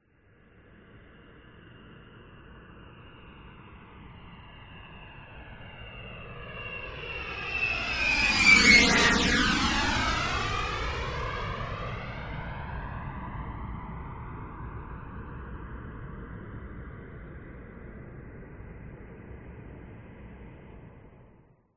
TRANSPORTATION JET MILITARY B-52 Stratofortress Flyby Right To Left Close